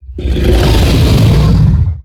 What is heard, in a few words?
Enter Play